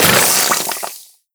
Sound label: spew, zombie blind; creatures